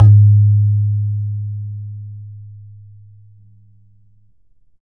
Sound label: BAYAN; Q Up Arts; Denny Jaeger Private Collection